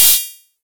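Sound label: Open hi-hat; Zero-G Trance Inducer; 90 s Sample CDs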